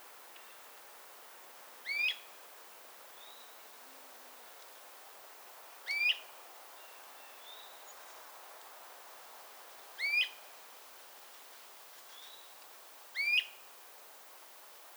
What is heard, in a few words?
Song Great Crested Flycatcher Myiarchus crinitus